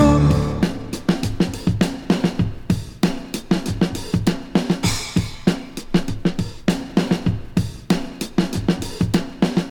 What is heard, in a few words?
99 bpm breakbeat sample D key, kick tuned to the D note